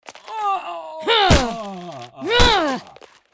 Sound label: War Face Stomp Loop; Goddess of Trampling